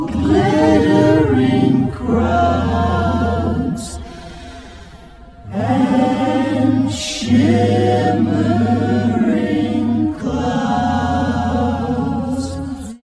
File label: lock